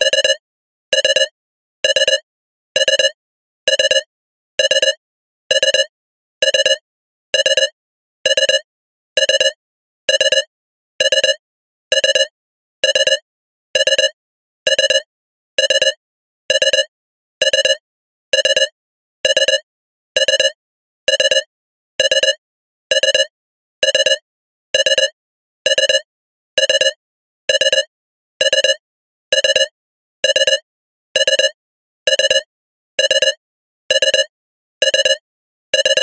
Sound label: science fiction alarm beeps digital 3 cluster medium